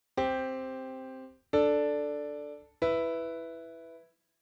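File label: fifths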